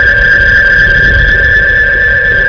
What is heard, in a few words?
noise of space ship